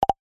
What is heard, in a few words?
multimedia notification pop message tooltip nudge